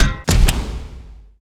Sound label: unjam; zrush